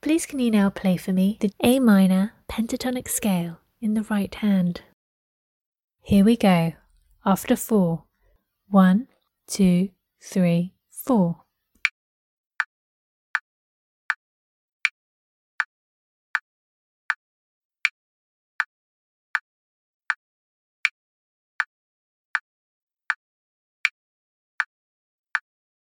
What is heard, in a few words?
a minor pent rh